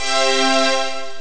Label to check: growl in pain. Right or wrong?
Wrong